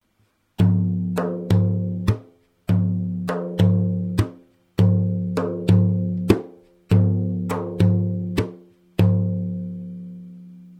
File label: tar, frame drum; notations